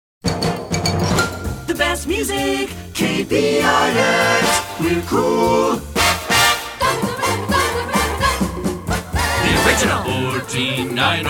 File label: Jingle; Short Attention Jingle Show IV